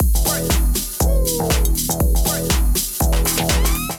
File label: Miami Heat Kit groove 120 bpm